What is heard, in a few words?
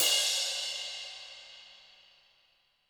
HiHat & Crash, Roland TD-20; HiHats